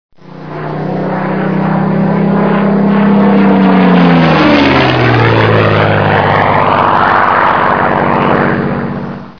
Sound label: Spitfire, avions